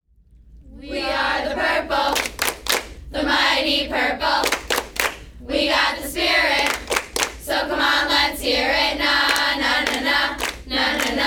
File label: cheers